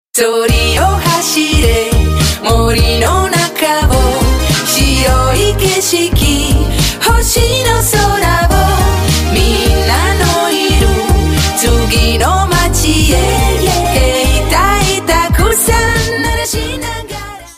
Xmas; Tele 2

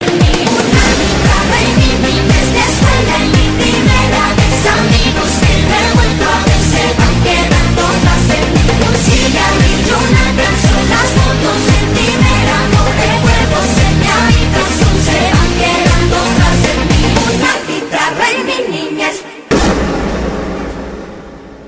spanish girl; latino

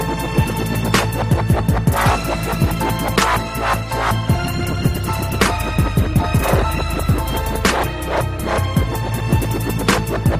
Dubstep

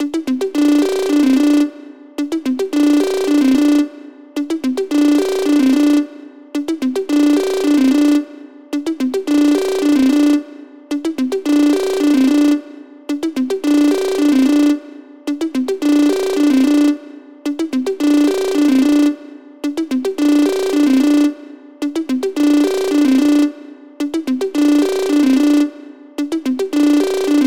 Trancy Ringtone

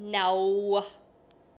Nhaaawww

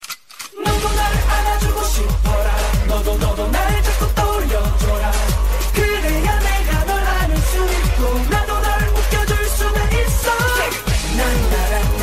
Beat

